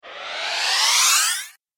Mage Spell Of Teleport, magic sound effect

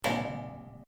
RUBBER ON METAL; Sound Effects:Materials

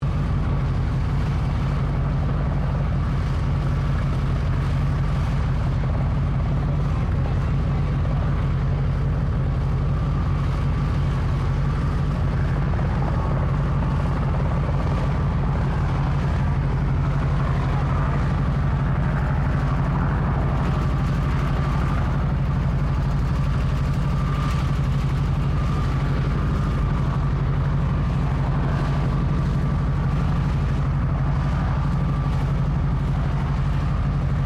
HELICOPTER 1982 ROBINSON R22 LOW IDLE LOOP; Helicopters; Vehicles; Aircraft Collection

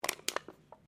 CAN SOFTDRINK BEND; various metal cans; Materials

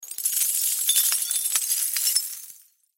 Crash Glass Debris Impact; Fall On Concrete; Sound Effects:Materials; Blastwave

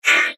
Beep Tone Signal Multimedia; Web Interactive

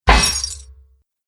Mace Destroys Chainmail Armor; Battle Sound Effects

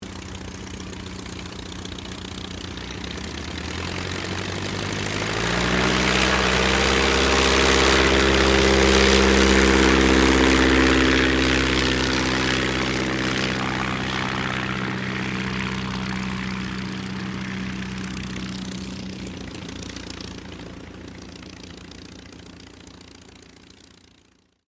AIRCRAFT DH82A DEHAVILAND TIGERMOTH TAKEOFF; Mixed selection of Tigermoths from the 1940s

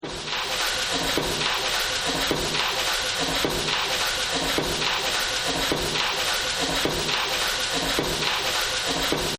Factory; Factory 09

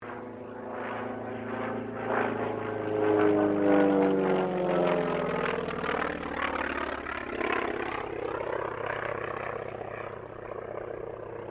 AIRCRAFT T-28D TROJAN PASS

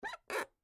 Creak Squeak; Creaking (Sound Effects:Objects); Blastwave; Foley

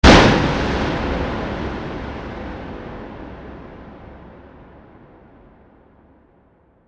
Hit Bright Heavy; Sound Design

